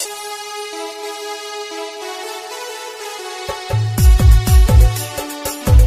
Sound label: Bengali Music Ringtone; ringtones